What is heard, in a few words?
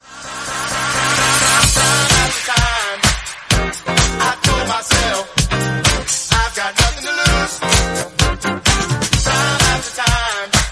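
128 bpm G minor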